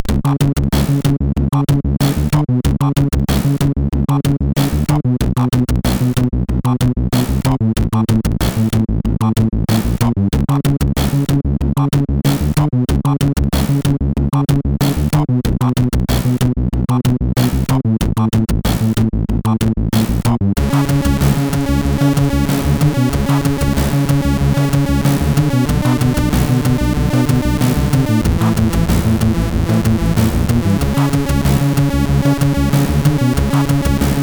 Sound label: trance